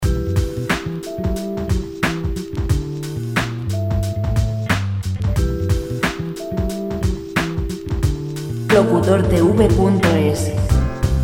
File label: 90 jazz